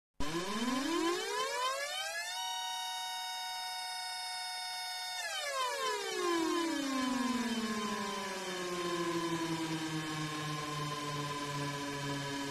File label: Outer Space Alarm